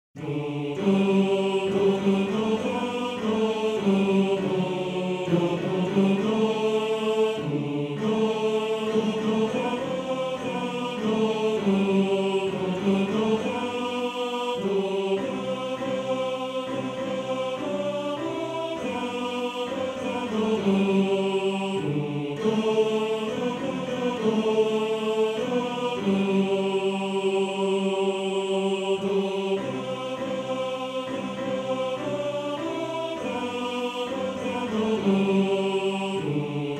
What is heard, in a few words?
Tenor 2, maennerchor; Bajazzo